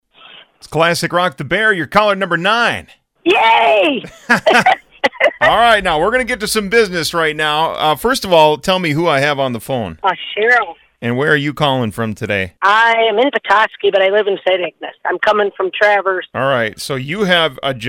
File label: riff